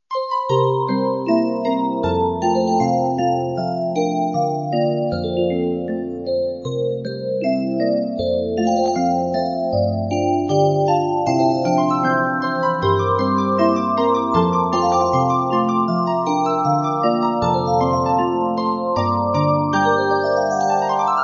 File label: Break